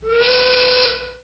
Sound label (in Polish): gwizd fabryczny